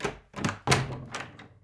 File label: door open